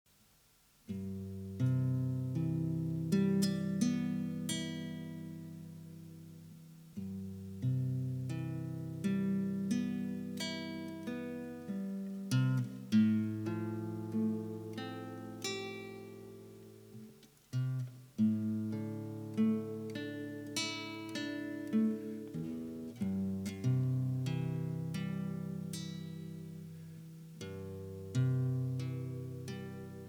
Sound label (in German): Atem Musik